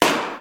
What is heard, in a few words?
se balloon